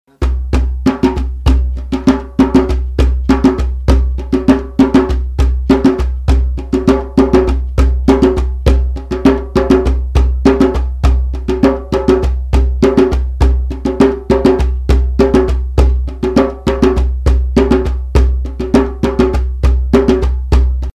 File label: Djembe